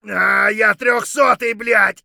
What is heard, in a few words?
bear3 hurt light; Escape from Tarkov